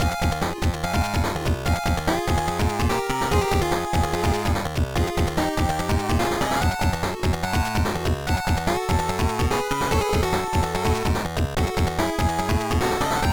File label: BGM; super street fighter ii turbo revival; gba